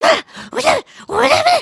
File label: panic, emotes